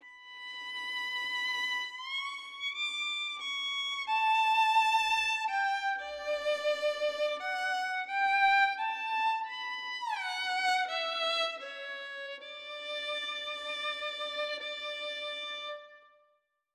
violin; suikoden

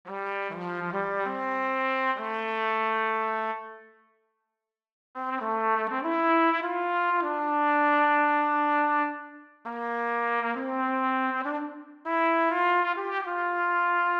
trumpet

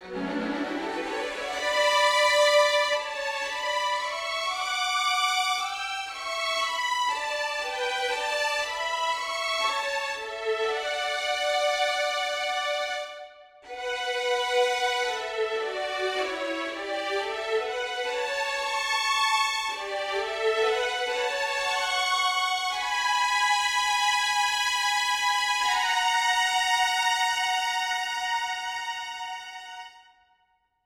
strings; suikoden; violin

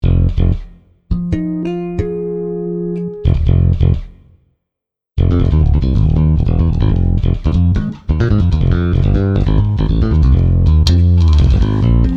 bass solo; sonic; greenhillzone

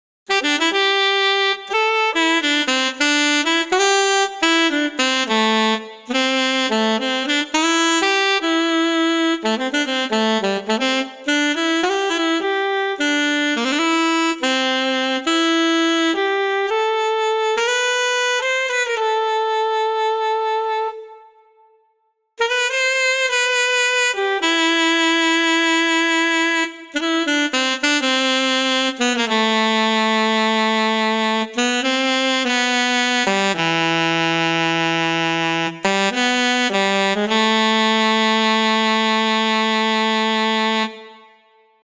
sax; knuckles

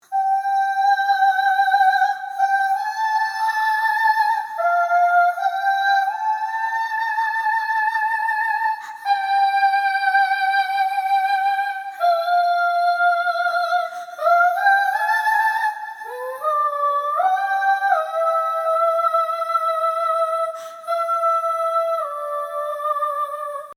oh2, vox

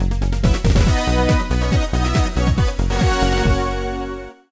start; msx; roadfighter

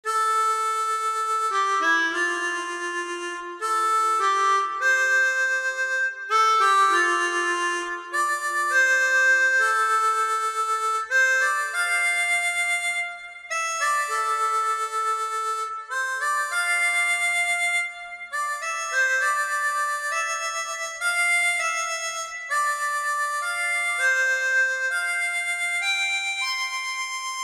harmonica

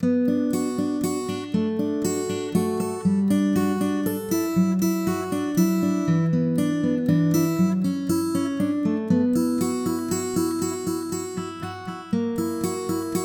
guitar; suikoden

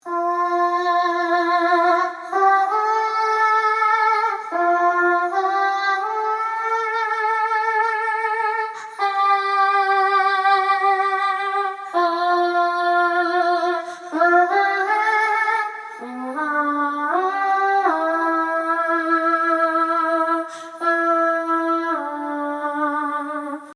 oh 2 new low, vox

